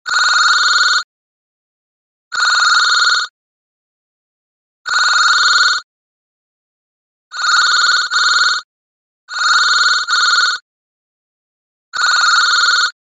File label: Office Phone Ring